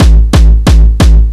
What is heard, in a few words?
180 hardcore